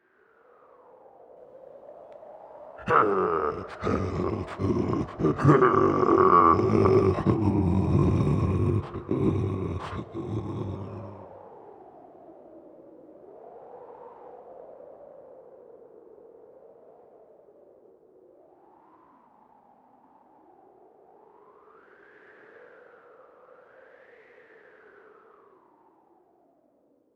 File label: Wolfman Growls; Scary Sound Effects